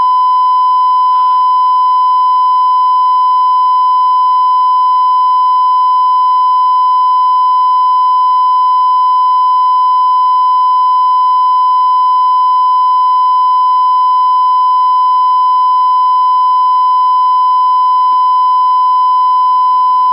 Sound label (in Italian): Calibrazione 94dB